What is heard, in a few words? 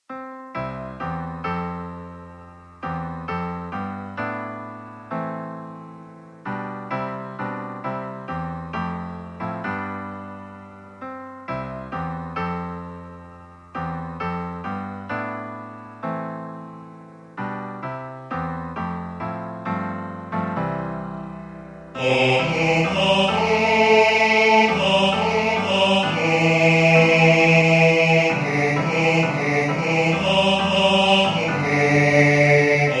Bass, I Heard The Bells